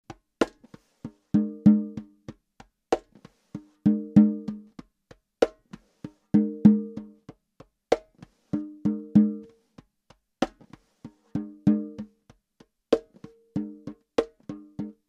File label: Drum Sounds hand drum